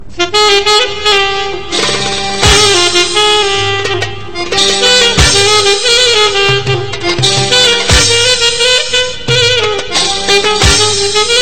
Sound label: arabian